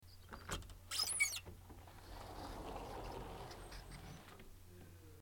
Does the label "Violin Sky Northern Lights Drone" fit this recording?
No